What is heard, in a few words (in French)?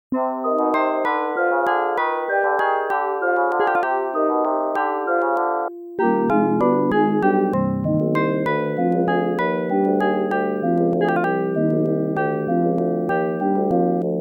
LA VALSE; Xanadu; (Field - Food Empty)